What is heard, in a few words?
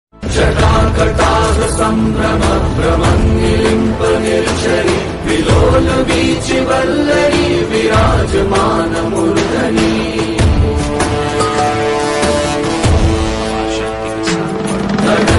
bhakti ringtone; mahadev ringtone; bholenath ringtone; shiv ringtone